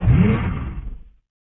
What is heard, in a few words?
Mech Motor; Sid Meier's Civilization 4 Complete; Beyond the Sword; Units